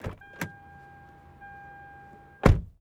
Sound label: car camry door open close with bell; Almost Maine